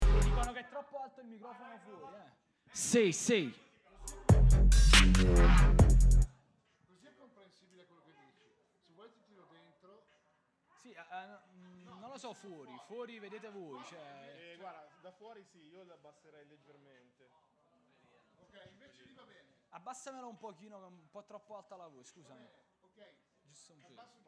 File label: Deep Dark Dubstep